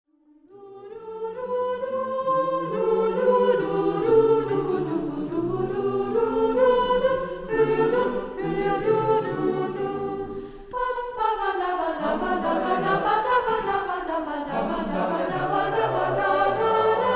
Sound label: Menuett; Mozart